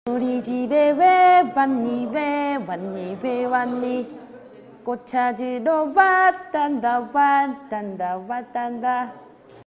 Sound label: children song